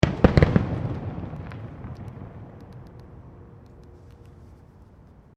Fireworks Multiple Explosions

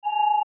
Beep Short Electric Warble; Production Element Imaging Element Accent Transition; Multimedia

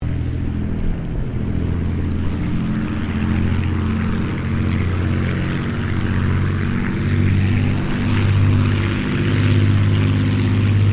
AIRCRAFT CAC CA-18 MUSTANG MK23 OPERATING MEDIUM; CA-18 /P-51 Mustang; Airplanes; Vehicles; Aircraft Collection; Twistedtracks

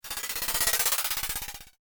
The Tin Machine, scary soundscape

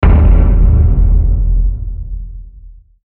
Hit Echo Reverb; sound design; Blastwave

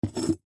Piggy Bank Empty Set Down; Money Sound Effects; Objects; Foley

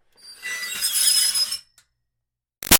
Screech Metal Iron Board Shrill; Ironing Board; Metal (Sound Effects:Materials); Big Room Sound

